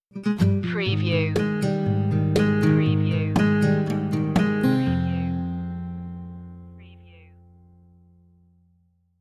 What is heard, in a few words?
rain and sunshine stinger